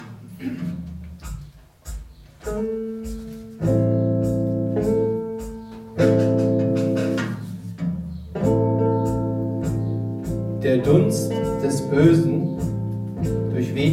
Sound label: Blues